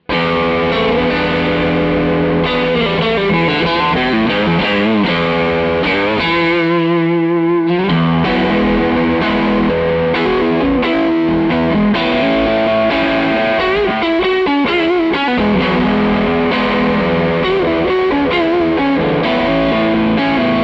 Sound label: Bassman Over Scream and Reverb; 53er; Fender 63 Reverb